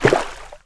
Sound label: Splash Small; the princess and the frog